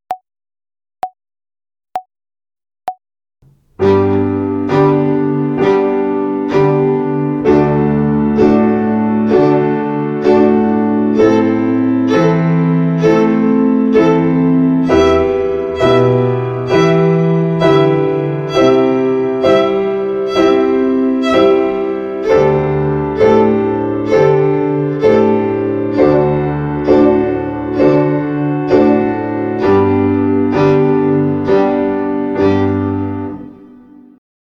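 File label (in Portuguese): Ginástica das cordas soltas com Martelé, Violino; Violino para os Pequeninos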